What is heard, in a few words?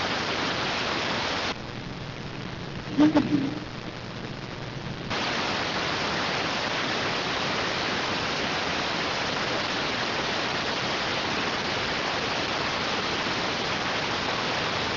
rtty rx